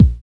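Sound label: bass drum; E-Lab; X Static Goldmine 1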